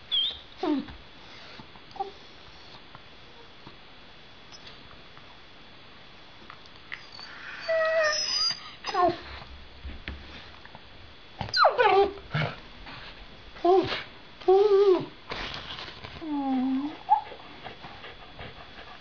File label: Whale Song